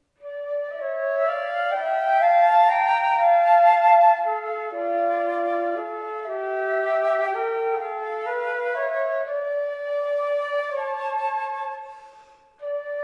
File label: lost flute